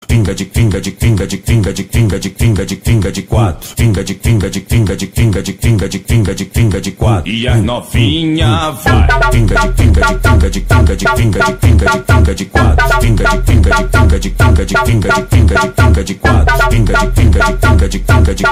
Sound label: Montagem